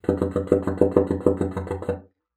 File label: BASSOON; Xsample VOL-6